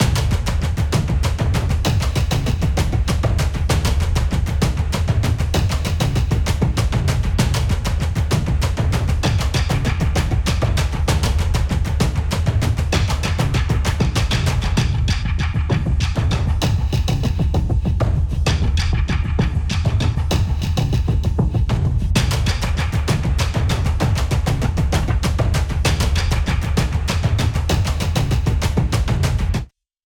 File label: Mus Action Perc High